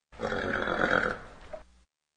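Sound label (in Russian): храп лошади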